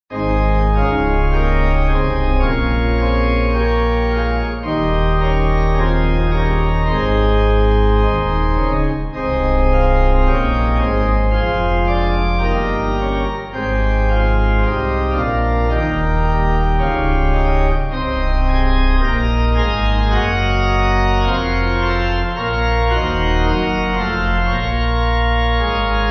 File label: When The Church, Cuddesdon, Pipe; 6.5.6.5.D; Organ; 4/Ab